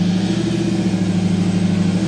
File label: brute idle, vehicles; Dystopia